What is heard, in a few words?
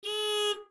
horn motorbike; Blind Drive